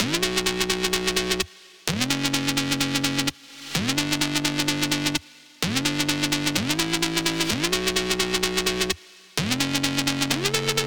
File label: 128 BPM B Min Chords, House; Drop Loops; Cymatics; Believer